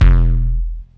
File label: ginormous distorted kick; Kicks; single hits